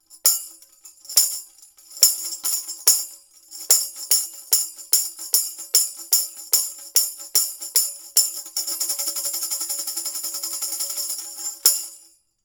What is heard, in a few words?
tambourine